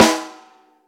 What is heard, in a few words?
tight lows steel snare drum D# key, Royality free snare drum sample tuned to the D# note.